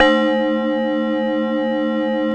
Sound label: BELL C 4, Korg; Korg 700S; Back In Time Records; Korg Universe VOL-1